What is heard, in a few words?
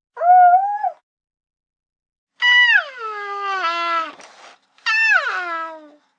Baby Cooing, 1 yr., high-pitched, happy